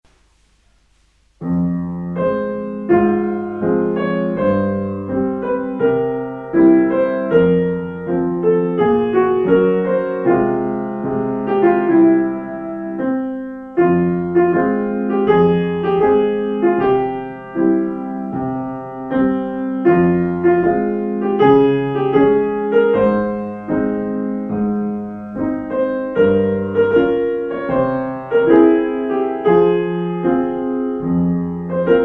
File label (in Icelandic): píanó